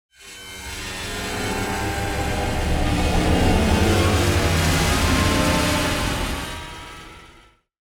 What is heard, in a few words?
Strings and wires dissonant bow stroke